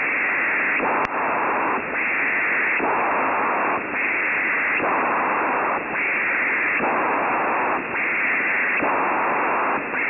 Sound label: Plasma TV on standby condition on 3.5 MHz